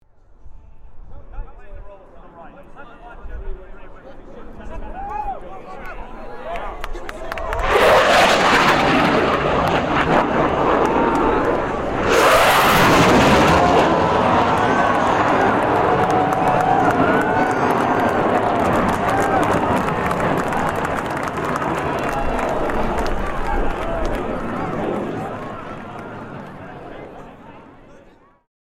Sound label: Blue Angel flyover